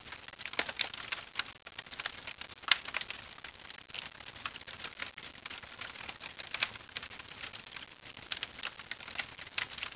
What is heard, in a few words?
barrel; nuke; jb nuke csbr